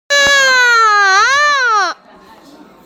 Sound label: bhoo